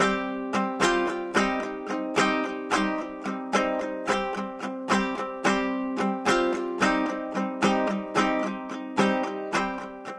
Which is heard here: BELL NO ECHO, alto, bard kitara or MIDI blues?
bard kitara